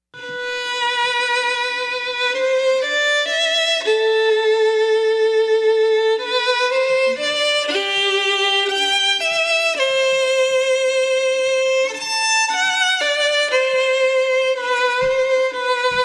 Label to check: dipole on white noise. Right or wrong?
Wrong